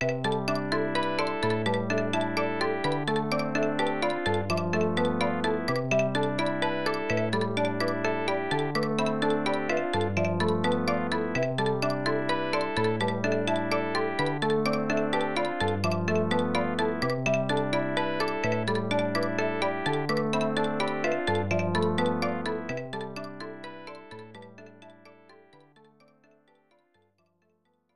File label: Conversation; CB Chara Wars; SNES